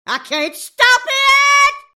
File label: train